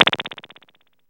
guiro, synth guiros; Zero-G Ambient 1

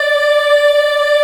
D4 child EH R, Choir; USB Soundscan vol.28

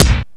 ROCK KIT

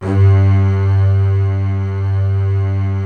C BASS G2; SOLO C. BASS; Greytsounds VOL-1

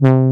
JX BRASS 2 C2; Jupiter Synths; E-MU Formula 4000 Series Vol. 3; Analog Odyssey

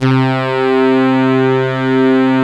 SYNTH LEAD; E-MU Formula 4000 Series Vol. 3; Analog Odyssey; EmuClassicSynths

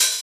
Open Hhat, Hihat; Equipped Music; Loungin House

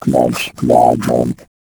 VOICE FX, Dance Vocals

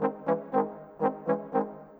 Trombones Tuba, Orchestral; Sonic Foundry (Sony Creative Software); Orchestral VOL-2; Modern 11

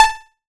Blip; Bitkits; Blip & Blop

